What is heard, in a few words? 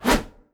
blade 1 hand swing